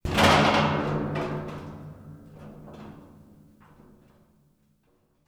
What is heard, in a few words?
Corrugated Iron Sheet; 99Sounds; The Warehouse